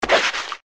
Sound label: jump; pepsiman